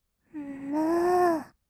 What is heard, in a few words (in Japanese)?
むー; 色々なワンショット; 1200 kawaii voice!!